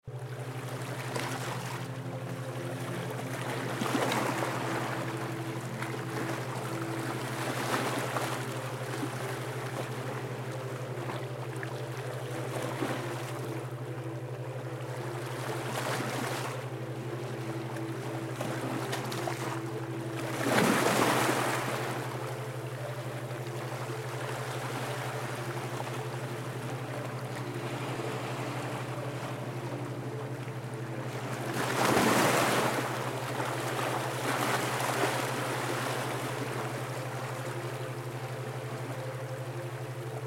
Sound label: Ocean Calming; Sound Baths